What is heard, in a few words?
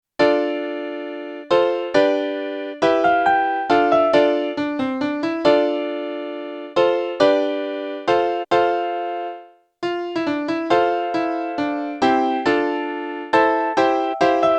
Modern Melody; early music